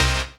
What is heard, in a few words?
HOUSE, House Dance